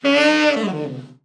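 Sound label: TENOR overblow FALL, Sax; TEN-OVB FALL; Giga Samples Collection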